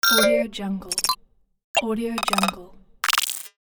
short blip sounds

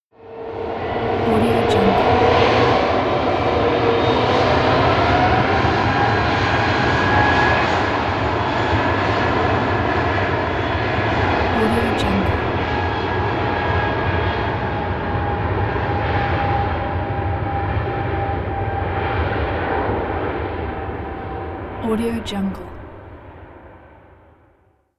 aircraft takeoff; soundfood

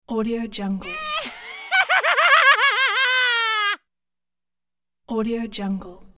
sarcastic laugh

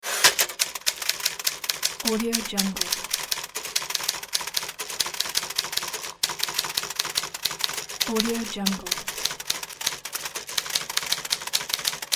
typewriter; cat track

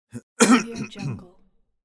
man cough clear throat

